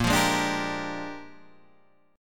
Bb 13th, Guitar, Bb13 chord; Bb13 strummed

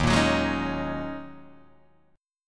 D sharp Minor 11th D sharp Guitar Open G, chord

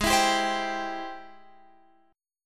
G sharp Augmented Major 7th, Guitar, Open F; strummed; x,15,16,14,15,14; JGuitar

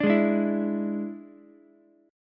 E 7th E down Ukulele; E7 strummed; JGuitar

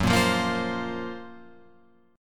Gb Major Sharp 11th, Guitar Open F; GbM#11 strummed; JGuitar

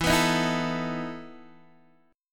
E Major 7th Suspended 4th E down Guitar Open D

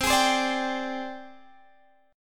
Ab Major Sharp 11th C

